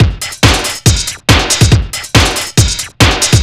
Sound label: Overdrive Drums